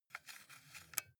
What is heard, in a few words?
console controller xbox joystick move soft